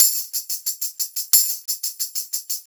90 TAMB

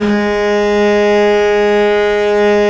G#2, Historical Instruments, VIOLE; USB Soundscan vol.23

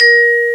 VIB OCT, MALLETS; FAIRLIGHT CMI IIX

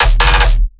bass hit blasting D#; Virtual Riot

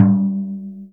INDIAN DR D#2; Spectrasonics; Heart of Asia; INDIAN SET

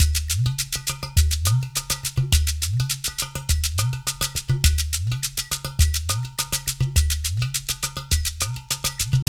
UDU, Udu Hip Hop

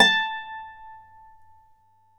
Celtic Harp, Plucked Strings

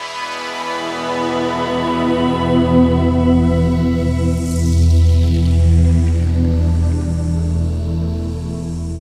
B, Drum & Bass Pads; Total Drum&Bass; 90 s Sample CDs

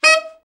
BRA ALT, Alto Sax

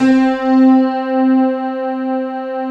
piano pad C4

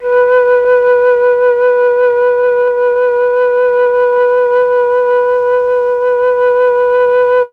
flute; ILIO; Propeller Island Legendary M400

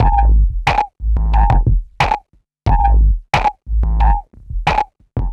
Gated Beat (90BPM); musicradar

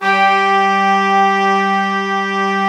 army brass G3; US ARMY; Synth

